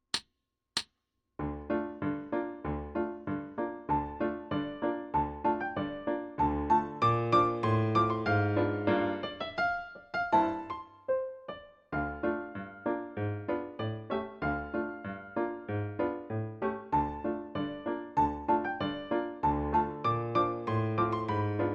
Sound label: Full Tempo with Solo, Flute; Solo Accompaniments; Koepke; Bergamask